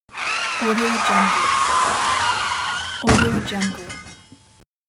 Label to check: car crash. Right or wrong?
Right